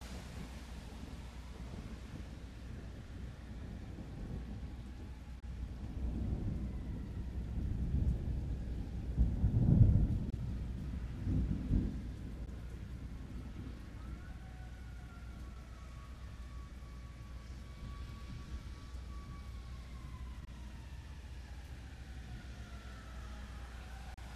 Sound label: siren